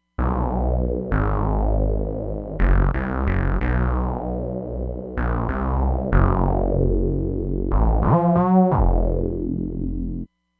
Nu RnB Saw, Roland Fantom XR

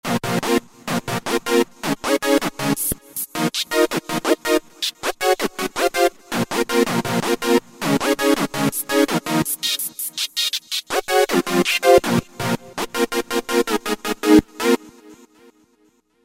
Dance OB, Roland SRX-03 Studio SRX

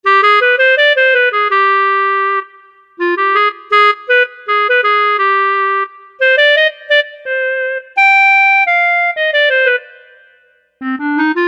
Clarinet, synth

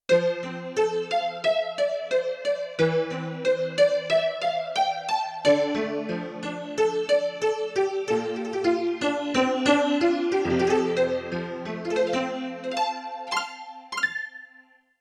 Yamaha CFIII Grand Piano; Yamaha TX16W; I JUST painted it

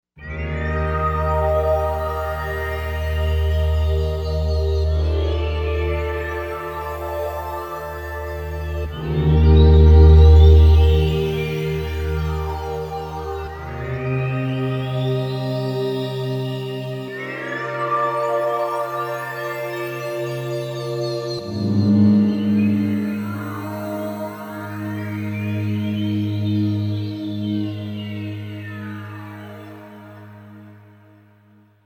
Korg M1, Combinations; Factory Patches; CosmicRain

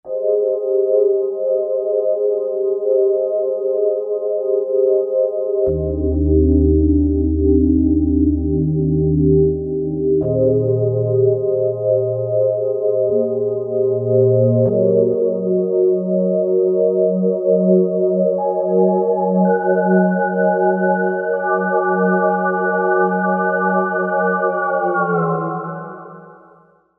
Fat Sine Pad, synth

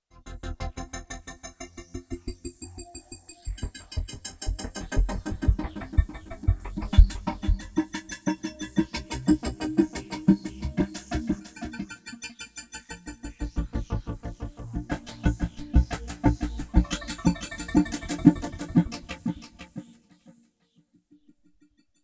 Sci-Fi Groove, Roland JD-800; Factory Patches

